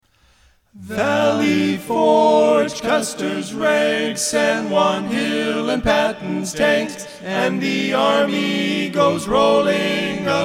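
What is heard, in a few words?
Military Medley ARMY tenor, chorus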